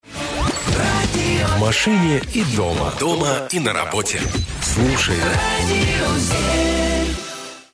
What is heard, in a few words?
Radio